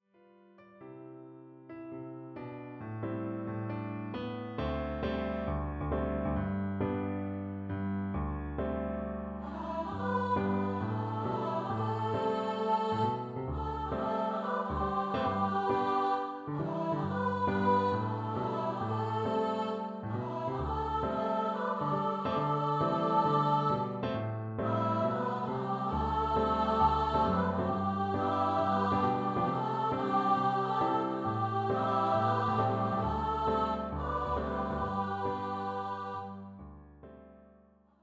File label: 2 part song for Treble Voices